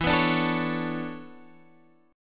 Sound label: E 13th Suspended 4th; X 7 9 7 10 9; strummed